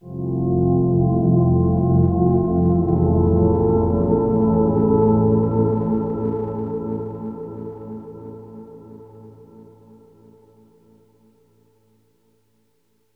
Tonal FX F 90 Bpm, Granular Tonal FX, Lo-Fi Kit; HipHop; Ghosthack; Advent Calendar

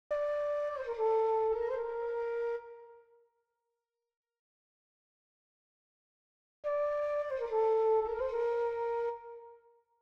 147 BPM G Min Flute; Cymatics; Angels

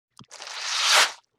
Wet Squoosh, Vintage Cartoon

